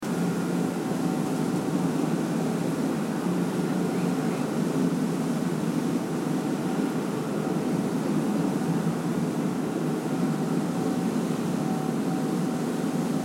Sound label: engine; Isle Royale Queen